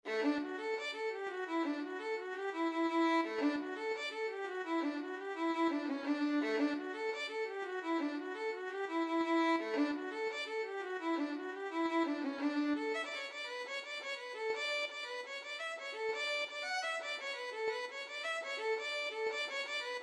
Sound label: violin